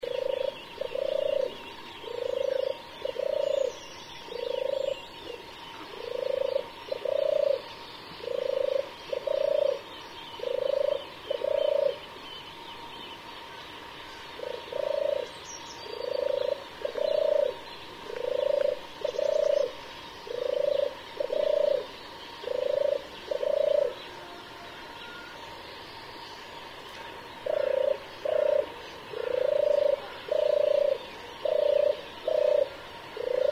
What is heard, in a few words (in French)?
Tourterelle des bois